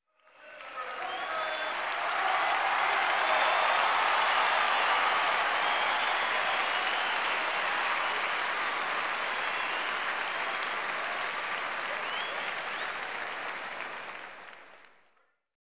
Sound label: People in a Stadium Applauding